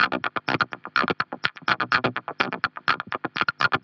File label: perc 125 cheap & nasty, Percussive Loops 125 bpm; Sample Magic - Transmission-X